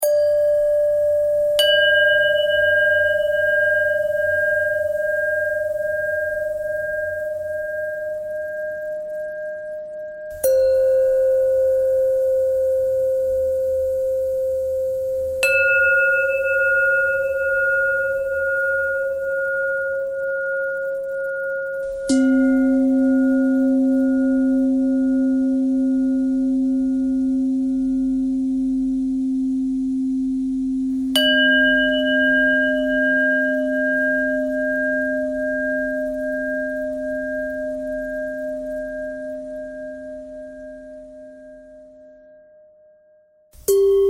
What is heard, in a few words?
bell bell thread simple, bells